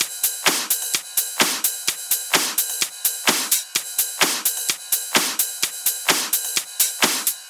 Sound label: 128BPM Drums; Uno; Vengeance Samples; Uno Kit